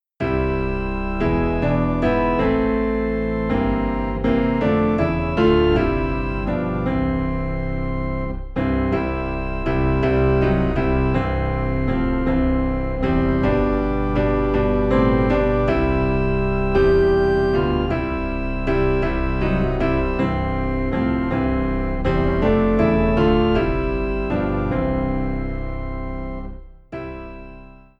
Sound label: Piano and Organ Low in C